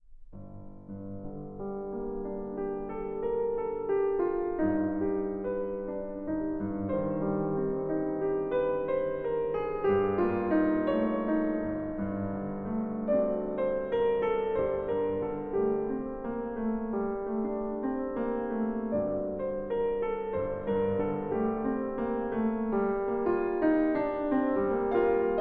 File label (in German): Fuge für Klavier in g moll; Deduktion und Fuge für Klavier in g moll; pianostukken van Wilhelm Berger